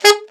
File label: ALTSAX D3; Best Service; Alpine Volksmusik